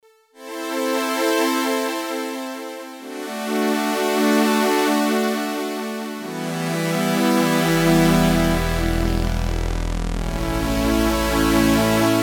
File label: Classic Analog Strings, Oberheim OB-8